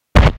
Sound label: walk